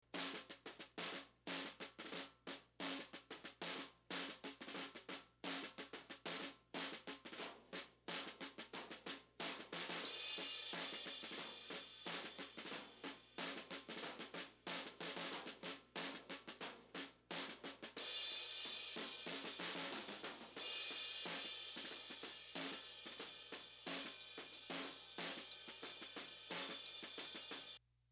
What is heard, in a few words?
snare stortion